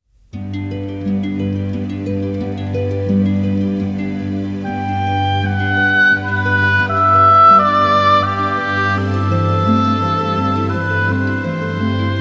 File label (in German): Harfen